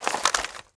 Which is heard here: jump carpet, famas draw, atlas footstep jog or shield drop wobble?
famas draw